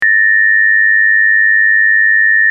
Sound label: warble, acoustic